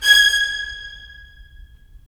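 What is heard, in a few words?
strings violins; Roland - LA Composer vol. 4; Violins Sh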